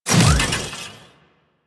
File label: Mech Melee Swing Start; Nechro Mech; Vehicles